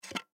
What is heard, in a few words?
Cd Rack Remove Cd; Plastic Movement; Foley; Plastic Sound Effects; Materials; Blastwave